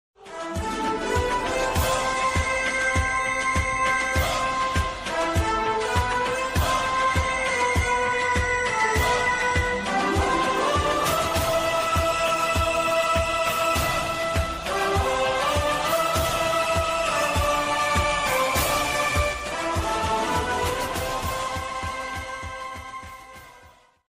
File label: BGM ringtone; Krish 3